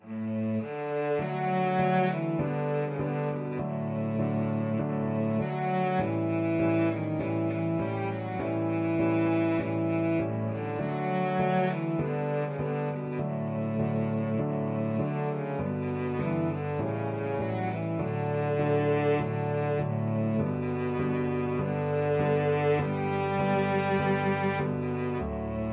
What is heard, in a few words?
cello